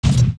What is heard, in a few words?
Link Fall Down Jabu Jabu